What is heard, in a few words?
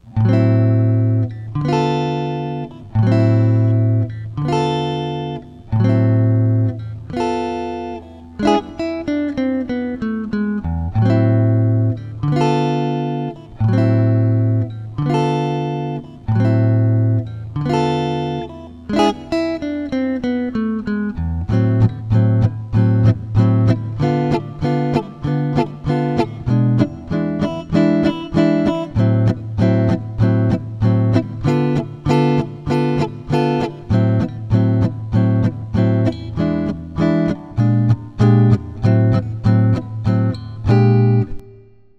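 Jazz Chords, guitar; instructional materials; jazz tunes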